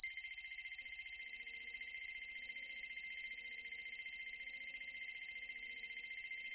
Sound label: count up loop; bloodsuckers2